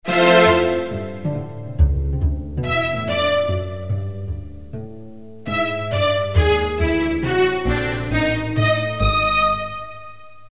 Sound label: Strings